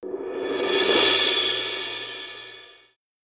R&B Swell Cymbal; R&B Funk Soul; Digidesign; Pro Tools; Pro Tools Essential Demos; Essential Loop Demos; Demo Session

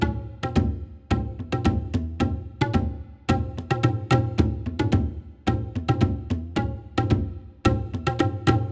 110 Hip Hop Percussion; Digidesign; Pro Tools; Pro Tools Essential Loops; World

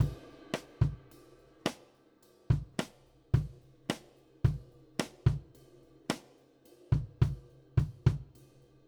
Country Drums Intro; Digidesign; Pro Tools Essential Loops

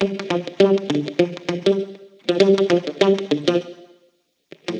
100 NuJazz Guitar Dmaj, Jazz Guitar Loops; Pro Tools Essential Loops; Digidesign

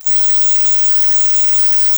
rythm

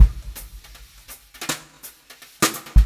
083 Pop Brush Drums; Pop Rock; Moderate Tempo; Pro Tools Essential Loops; Digidesign

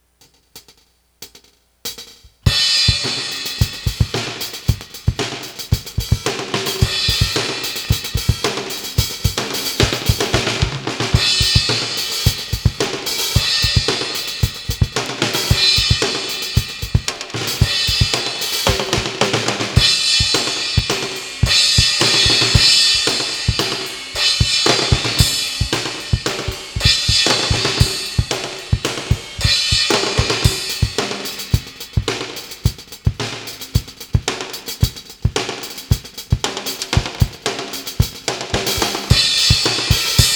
drums

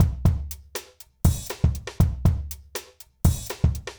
120 Pop Drums A, Fast Tempo; Pop Rock; Digidesign; Pro Tools; Pro Tools Essential Loops

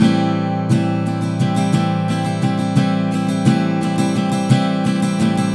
087 Pop Ac-Guitar Strum (Add)7 Amin; Pop Rock; Acoustic Guitar; Pro Tools Essential Loops; Digidesign

